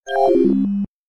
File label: unexpected, message tones